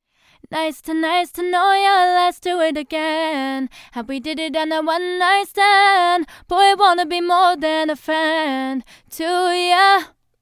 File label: female vocals; lewitt